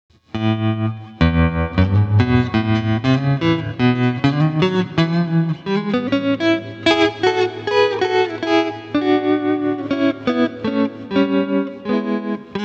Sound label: Guitar Tremolo, AXE FX 3